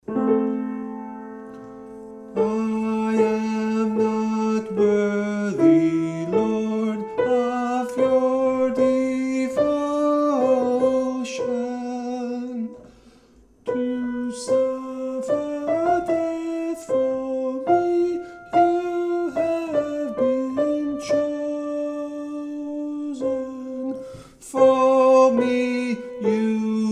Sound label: Soprano 1st verse